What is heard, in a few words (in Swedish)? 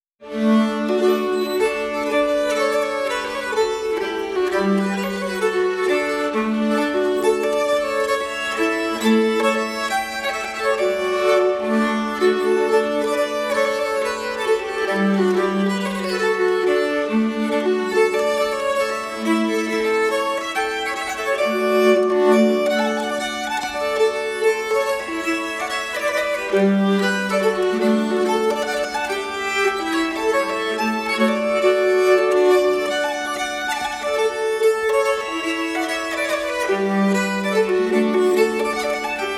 Polska; efter Styfberg